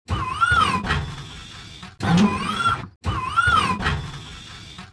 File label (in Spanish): robot de trabajo